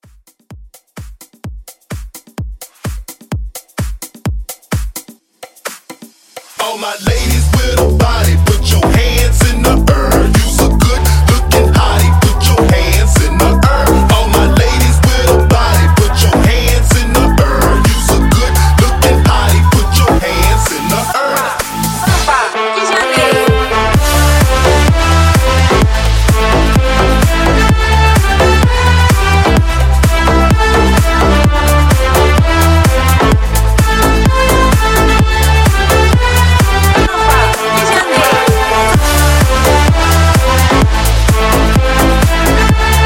House Reboot

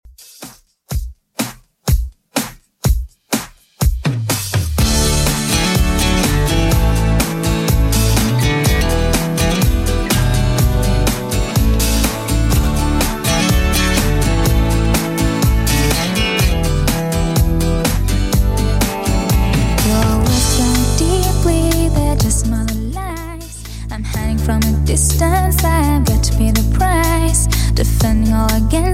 90s Redrum 124bpm

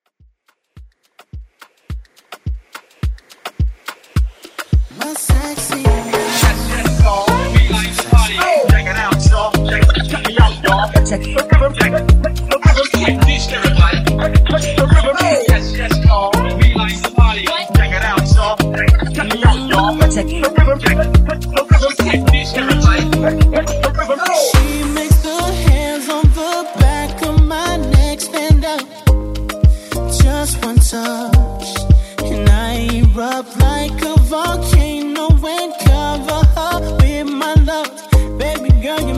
Moombah Mixshow Hype

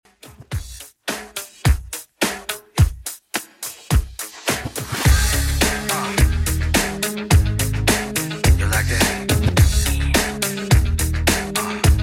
2ks Redrum 105bpm

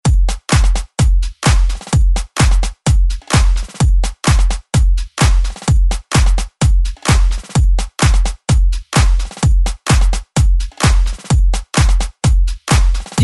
20s Re Drum 128Bpm

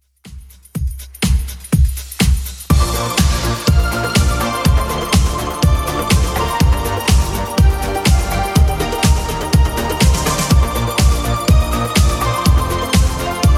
80s Club Edit 123bpm

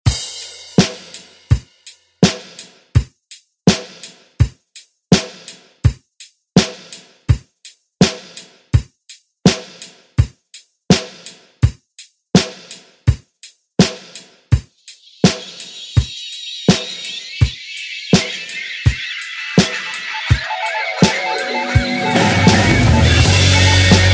90s Re Drum; DJ Mhark

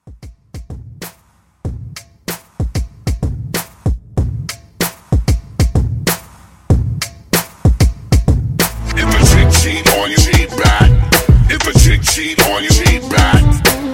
Re Drum 95

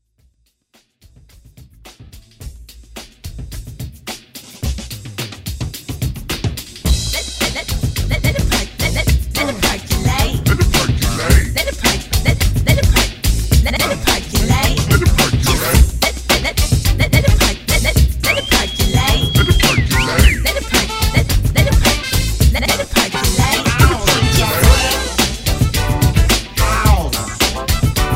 70s Funk

